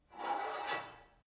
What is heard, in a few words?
take off light weight barbell soft; sport freizeit